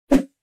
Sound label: Fast swish